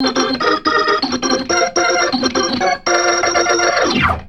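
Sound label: RIFF left; InVision Interactive; Keith Emerson; P.BLOOD OCTV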